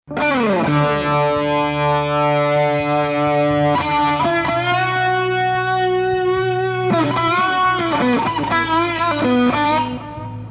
SPC EXG SPC ON 10 MUFF

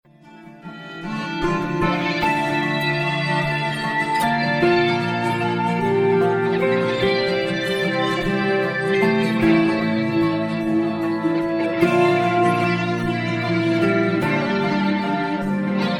modulated solo extraction

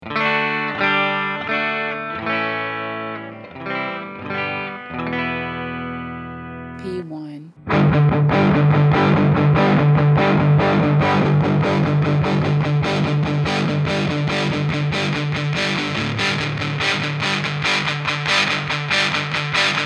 P1 P2 G2 Tone Pot Sweep

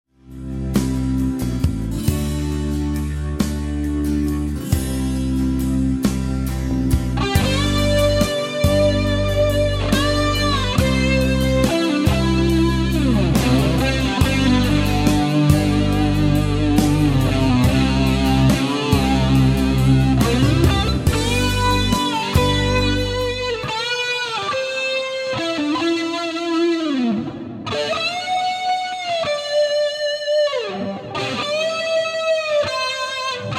solo EHX Big Muff

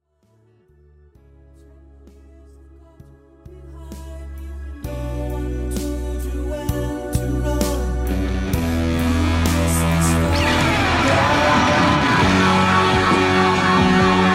Sunface solo w-ADT, rev, echo; Sunface is on maximum gain. Sundial at 0%

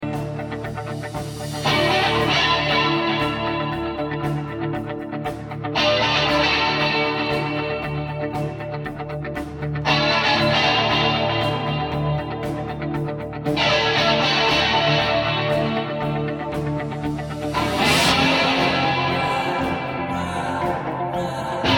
Sustained Chords Mixed Up Front

